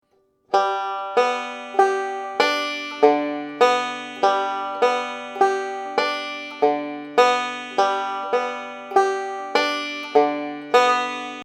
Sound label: waltz roll